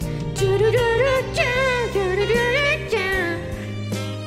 instrumentals moment

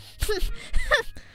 hmph

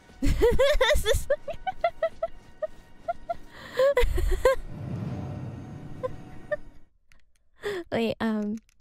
laugh

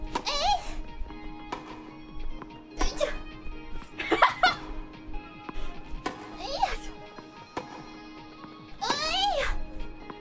tennis noises

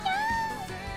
kyaaaa

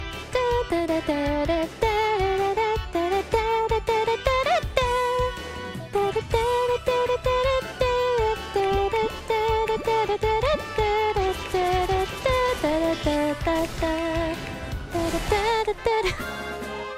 Singing; HoloCouncilRPG battle theme; IRySinging

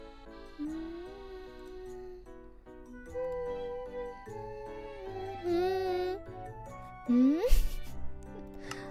humming; IRyS; MC streams BGM; IRySinging

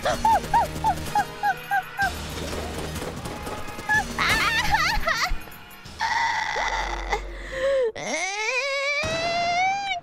noises; IRyScream

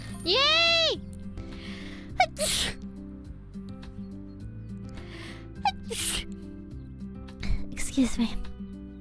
Sneeze

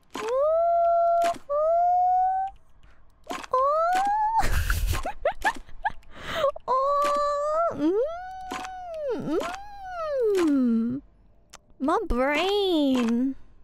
thinking

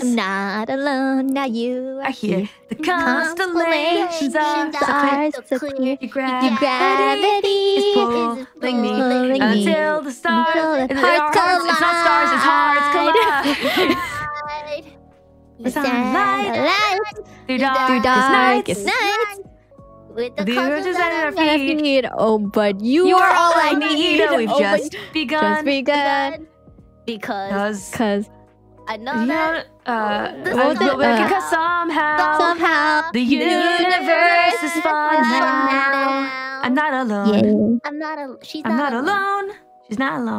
singing; CHADCAST

